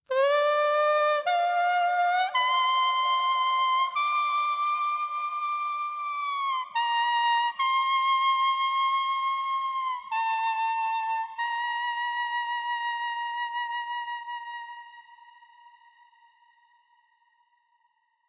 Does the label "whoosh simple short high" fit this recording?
No